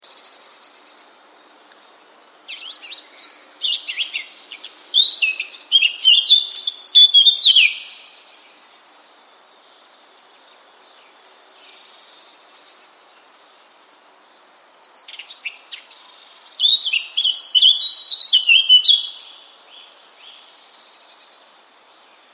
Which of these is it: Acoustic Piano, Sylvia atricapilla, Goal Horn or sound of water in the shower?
Sylvia atricapilla